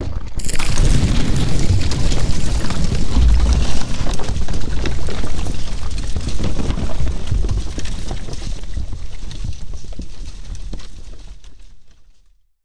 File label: falling stone debris, Bomb Blast Explosion and Debris Sound Elements; Bluezone